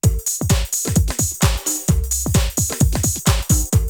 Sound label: house, loops